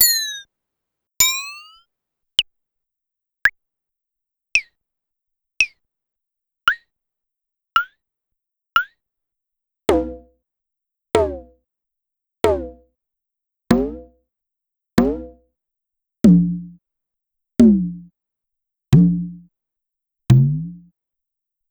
Clavia DDrum-AT Pitch Env Various

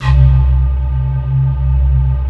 PAN C2, SYNTH; Q Up Arts; Dream Experience

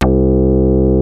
Moog synth; OLD SYNTHS; Rubber Chicken Software Sample Bank

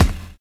FAT DISCO; Univers Sons

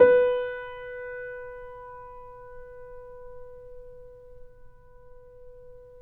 Grand Piano PP B3; AKAI S6000 CD-ROM - Volume 2; Grand Piano 256M

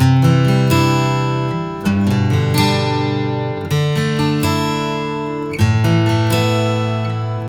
Acoustic Pop Guitar 140BPM Cmaj; Vengeance - Essential Clubsounds Vol.4

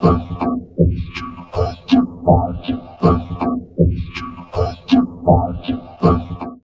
VOCOD, Drum Loops Crazy Processed

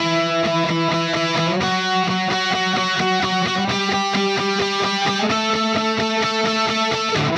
Guitar, Indie Rock; Sonic Foundry (Sony Creative Software); Indie Rock Anthems; Session 10 - Roswell

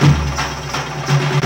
DHOLE; AMG - Now CD-ROM (Roland)

